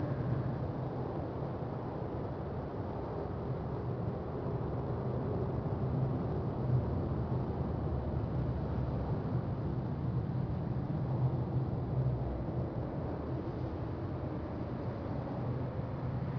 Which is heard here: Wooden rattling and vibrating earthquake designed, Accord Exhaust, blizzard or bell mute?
blizzard